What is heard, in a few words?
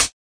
hat; DRUMS (SINGLE HITS); Hats